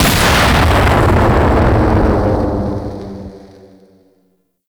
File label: Orbital Strike Drone Explosion; Vay Hek Orbital Strike Drone